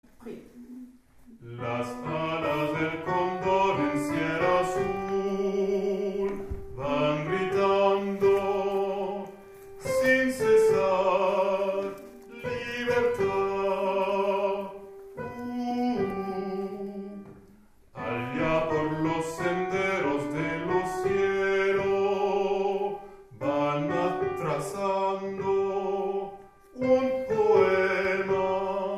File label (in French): Hommes